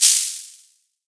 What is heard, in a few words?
Shake Maracas; FREE SFX PACK VOL 2